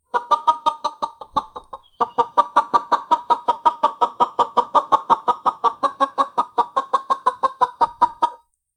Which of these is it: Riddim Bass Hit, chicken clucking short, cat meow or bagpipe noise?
chicken clucking short